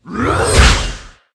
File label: gnoll attack, monster